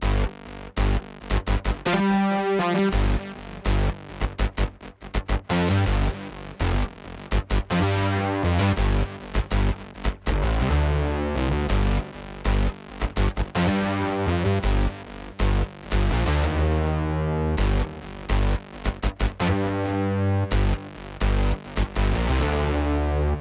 bass C, Smooth RnB; 082 Gmin; Big Fish Audio; Legendary; Shes Mine

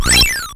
Pac kill, ATARI

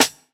Snare, jungle breaks drum sounds

